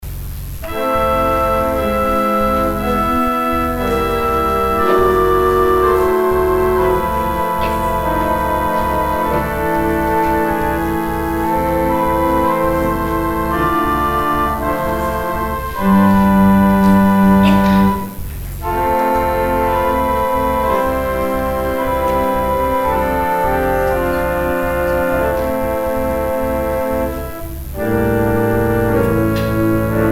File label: organ interlude